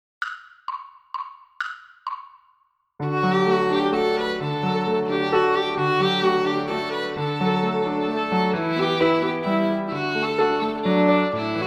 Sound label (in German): Bärenwalzer